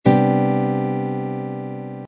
c major seventh suspended second chord voicing, guitar